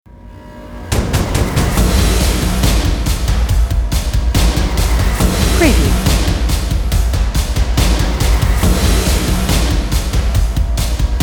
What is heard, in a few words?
Cinematic Mechanical Rhythmic Action Drums Trailer Intro